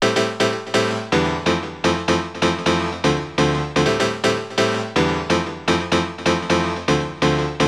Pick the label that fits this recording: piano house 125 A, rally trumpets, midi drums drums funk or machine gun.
piano house 125 A